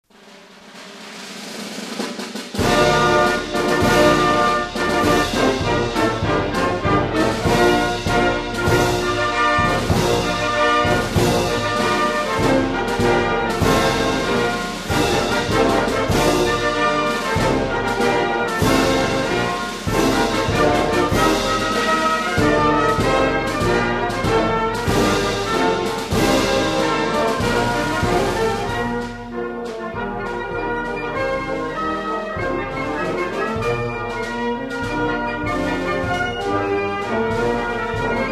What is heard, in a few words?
Qatar National Anthem